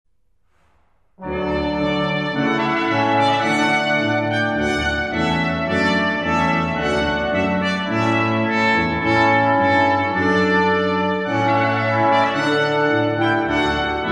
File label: Quintet Trumpet Voluntary; Clarke